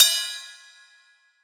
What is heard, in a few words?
Ride, Cymbals; drumkits; fl studio; bvker drumkit